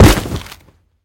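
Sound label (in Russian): мощный бросок огромного камня с горы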